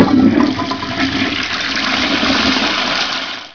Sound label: toilet